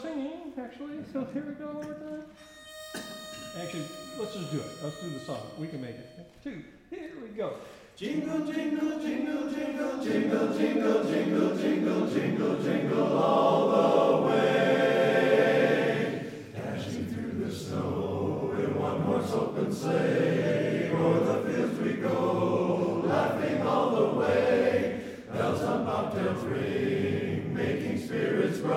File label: Jingle Bells, Chorus; christmas show